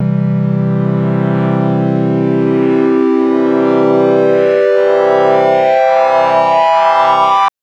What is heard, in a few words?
Synth TriangleSweep maj c2; TrashLibrary